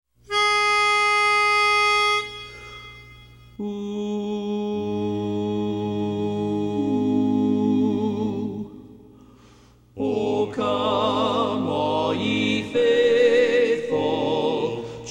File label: Lead